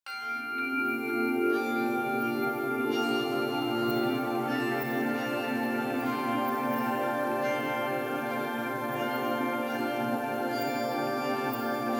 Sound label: ambient